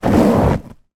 household leather chair cushion sudden hard movement squeak creak